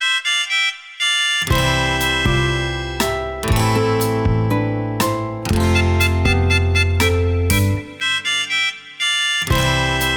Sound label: Playful